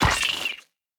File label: Death Bug